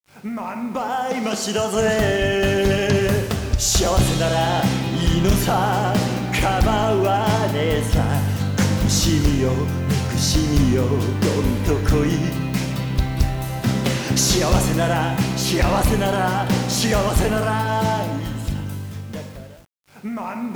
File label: karaoke bgm